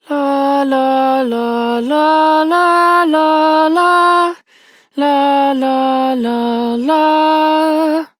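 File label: Child Rhymes Hum, Child Male; Duviri; Childrens Rhymes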